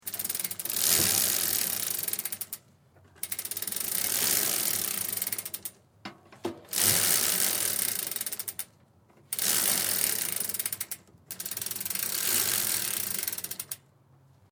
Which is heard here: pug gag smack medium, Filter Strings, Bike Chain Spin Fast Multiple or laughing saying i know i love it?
Bike Chain Spin Fast Multiple